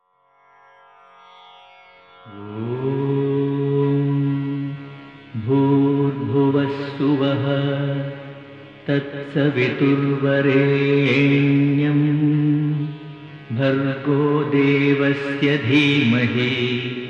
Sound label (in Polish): mantry swiata om i gayatri